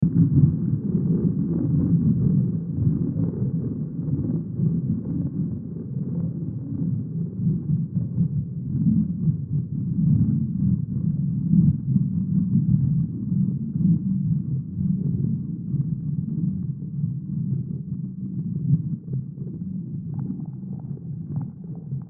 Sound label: Bed Filter Dark Eerie; Blastwave; Beds